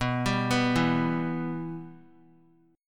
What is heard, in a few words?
B Suspended 4th Sharp 5th B